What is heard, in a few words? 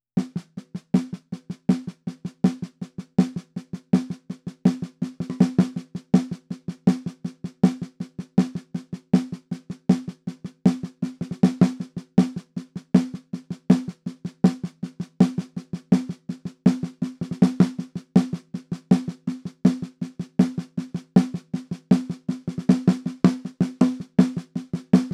perc intro snare